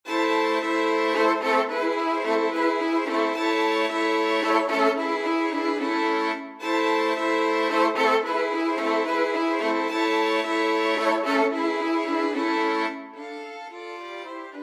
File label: violin quartet; dvorak slavonic